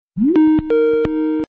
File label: Skype, dzwonki na telefon; Efekty Dźwiękowe